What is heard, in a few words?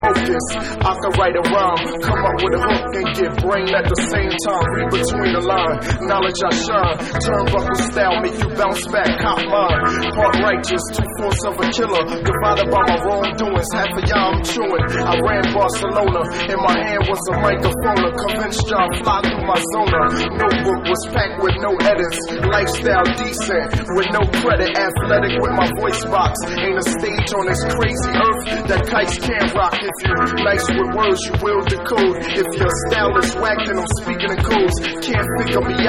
Vocal